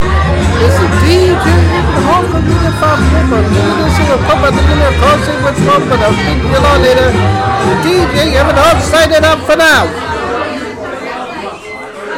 pub

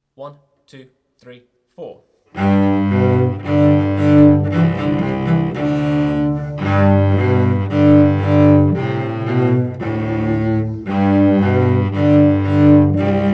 cello

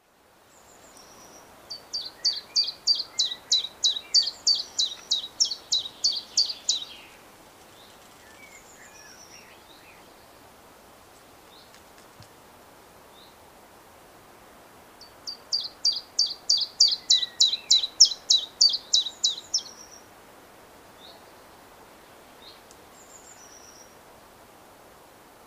chiffchaff singing

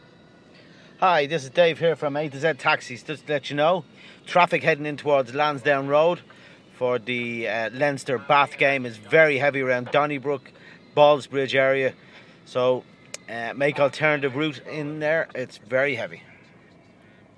traffic at aviva

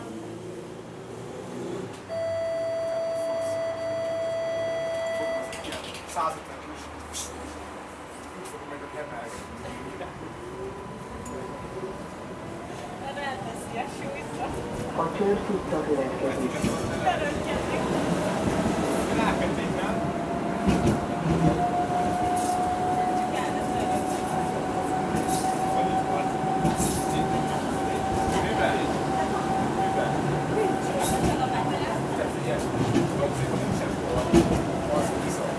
Budapest tram sounds

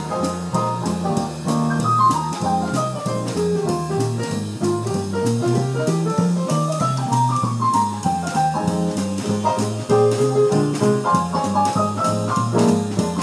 jazz at the scarborough cricket festival

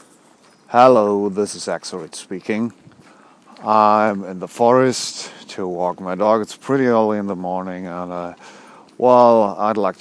lead guitar recordings

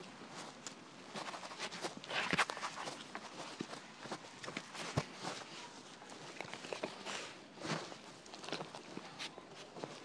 two puppies licking face

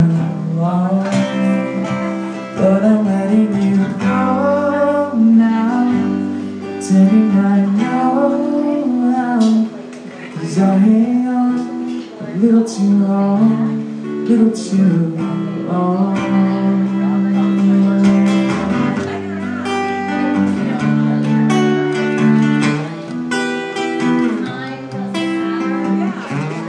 the saxon pub singer sings a little too long

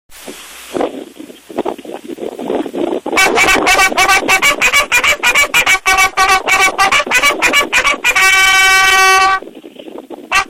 bugle

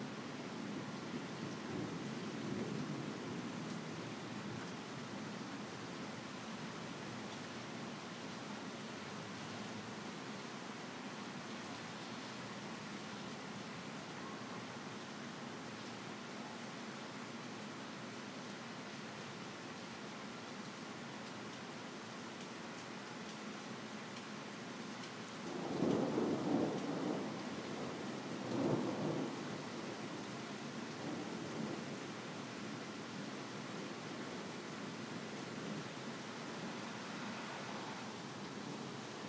spring thunderstorm in alexandria va usa

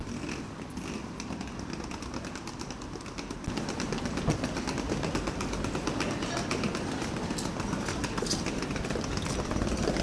Albion Street 60 second ambient

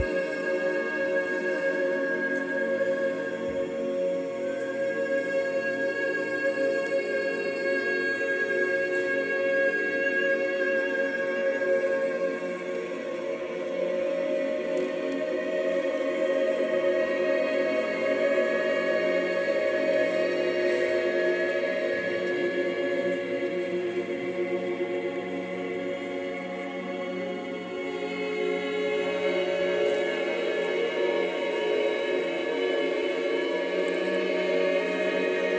super critical mass voices

